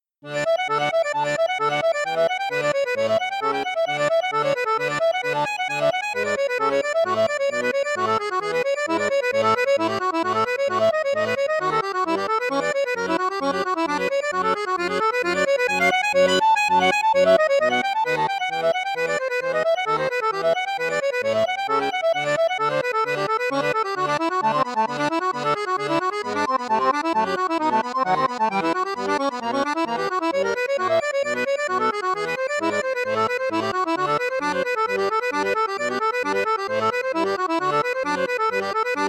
registre basson piccolo, accordeon; prelude bwv850; fratelli crosio super pro 9

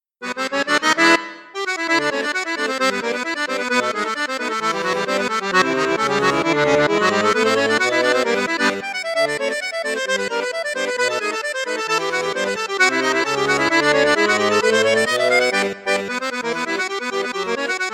registre basson flute juste, accordeon; saltarelle

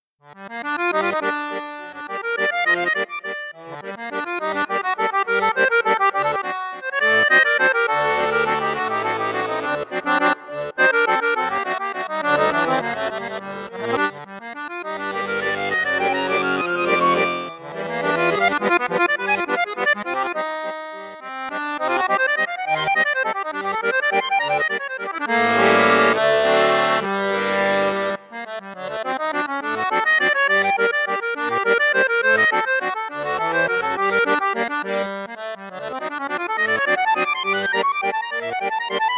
registre 8 8, accordeon bayan; borsini bayan

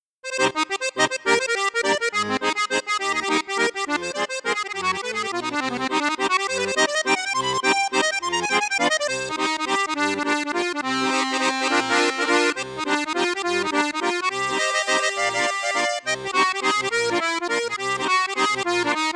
registre flute juste flute haute, accordeon; cavagnolo vedette

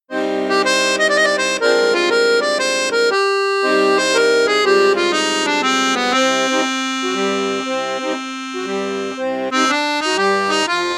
registre flute juste, accordeon; mengascini mini retro

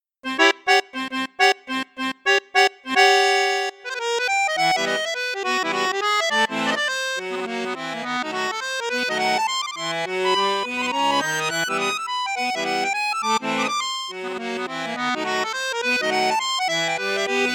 registre flute juste, accordeon; cavagnolo professionnel 12

